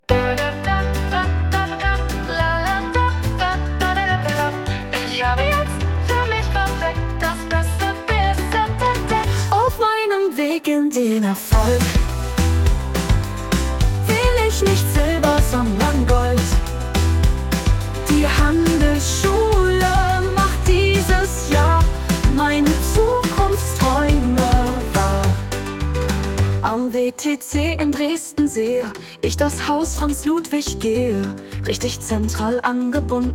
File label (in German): Schulsong pop